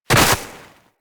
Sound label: Land Soft Snow, Footsteps; Characters; Operator